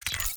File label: ui photo